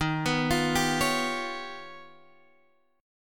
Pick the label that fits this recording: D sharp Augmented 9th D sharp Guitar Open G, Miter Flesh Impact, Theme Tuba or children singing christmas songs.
D sharp Augmented 9th D sharp Guitar Open G